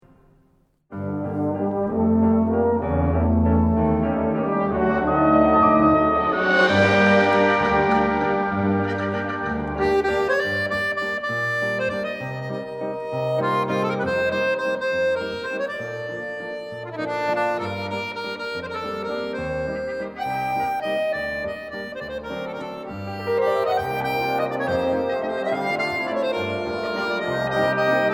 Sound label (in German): mit Brass Band